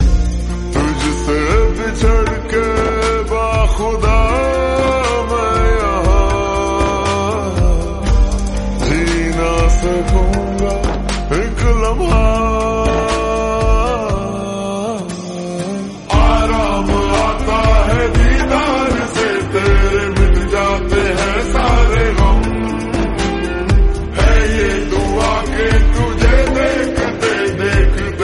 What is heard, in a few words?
SLOWED REVERB RINGTONE